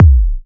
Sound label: Techno Kick